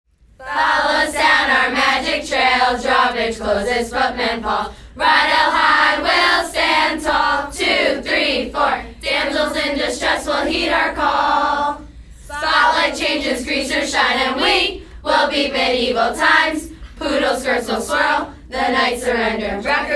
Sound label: cheers